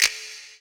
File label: SNAP; 90 s Sample CDs; Best Service Dance Mega Drums